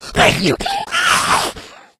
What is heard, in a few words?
become enraged, infected